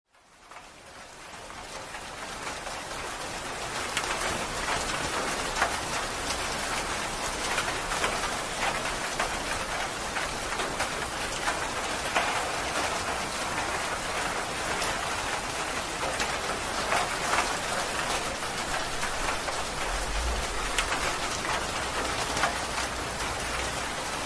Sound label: Rain on roof